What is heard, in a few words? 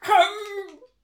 ouch; rapidragdoll; bony